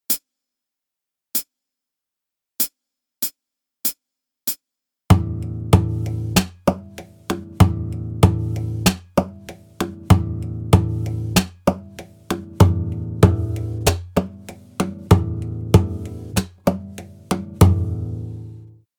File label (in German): Rhythmus; Play Guitar Gitarrenschule 2